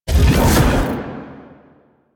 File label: Sphere Cast; Warframe; Gyre